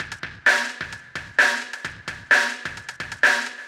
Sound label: Drumkit; Ableton; Factory Packs